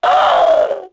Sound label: Hit; Star Wars Knights Of The Old Republic II; XBOX